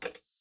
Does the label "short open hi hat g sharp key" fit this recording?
No